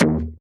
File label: hollow metal hit